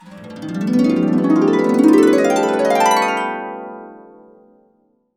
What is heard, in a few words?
A CASCADE UP, Harps; Complete Harps; USB Soundscan vol.62; AKAI